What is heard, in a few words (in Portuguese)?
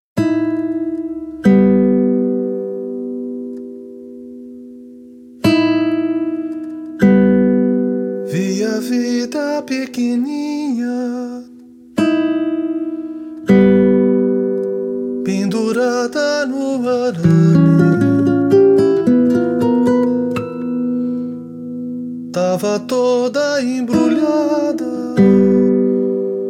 Viola quietinha